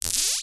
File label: leisure toy buzz rattle magnets click vibrate